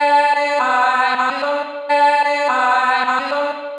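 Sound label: chopped vocals female B minor 111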